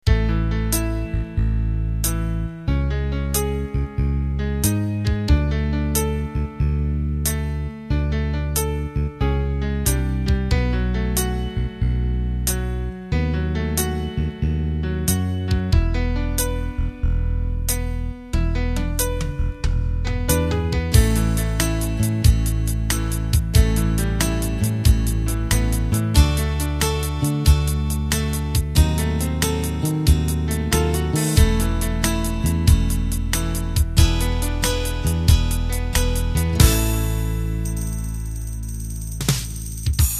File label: Unplugged Slow Rock; Steel-Stringed Guitars; "Unplugged" rock/pop/c&W 6/8 and 12/8 Ballads; Unplugged Slow Rock 1Y; Second in the pair of gentle, unplugged 6/8 or 12/8 "unplugged" balla